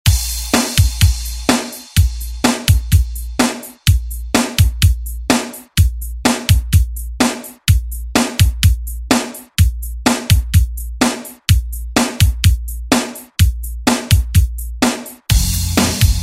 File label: ReDrum